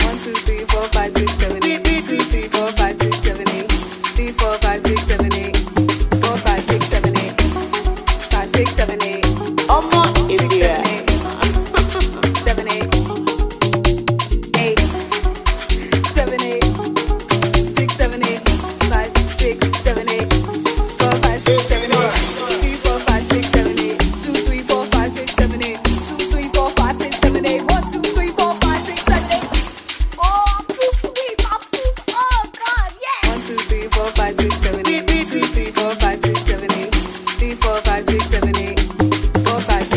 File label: Cruise Beat